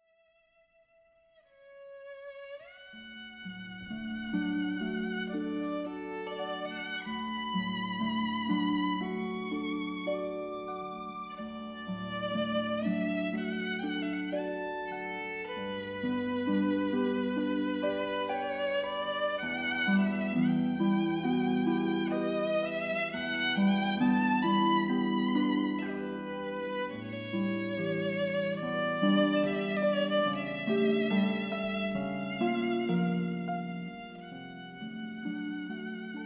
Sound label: Harp and Violin Ensemble; Seattle